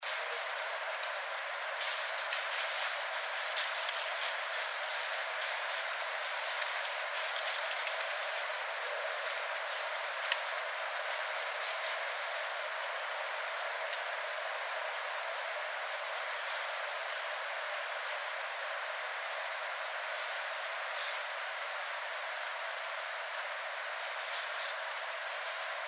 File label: Red Fox; night sounds